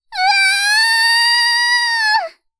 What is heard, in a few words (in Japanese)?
"うわああああ！", アナウンサー; ハイグレボイス集